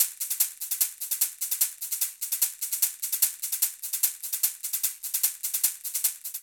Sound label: Latin Jazz Caxixi 149bpm, Latin Percussion; Ableton; Factory Packs